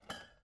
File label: bottle clinks; angband